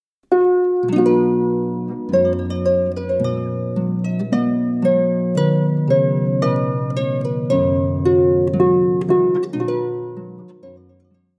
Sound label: trumpet voluntary